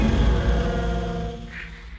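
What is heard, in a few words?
Music Volcano 120; From Dust; ps3; xbox 360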